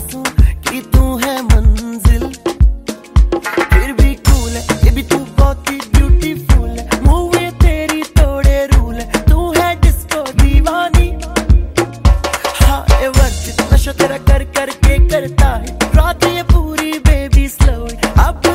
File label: dj mix; bollywood ringtones